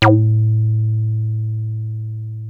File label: 303 A2, TB 303